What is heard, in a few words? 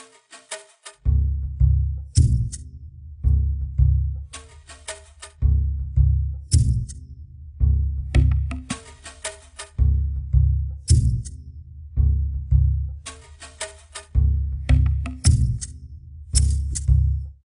Jungle Level